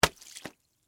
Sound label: Splat Wet Drop, Sponge; Texture; Drop Sound Effects; Drops (Sound Effects:Objects); BlastWave